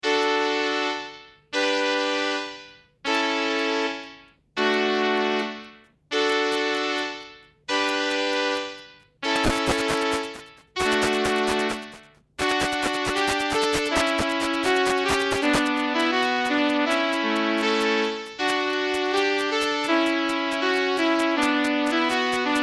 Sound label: Fanfare; ChristmasTunes